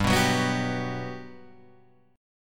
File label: G Major 7th, G, down, Guitar, Open G